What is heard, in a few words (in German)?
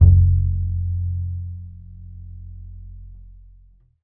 KONTRABASS F; Alpine Volksmusik